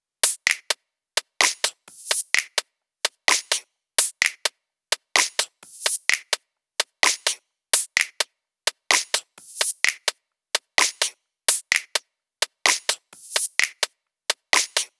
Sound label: Minimal Drums; Mutekki Media; Vengeance Electroshock Vol.2